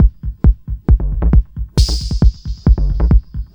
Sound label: Drums Techno